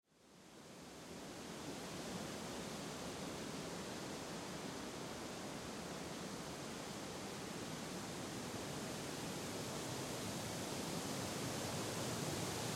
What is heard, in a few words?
Wind forest; moonlight maps battle pit